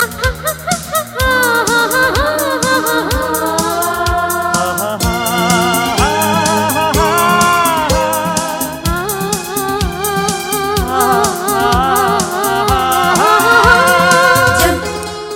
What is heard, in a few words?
ahahahahaha